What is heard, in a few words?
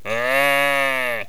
sheep; megaglest